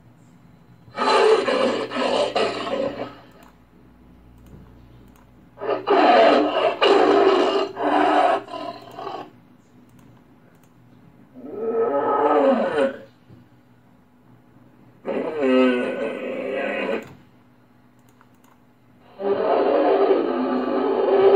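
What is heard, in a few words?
rosomaha, wolverine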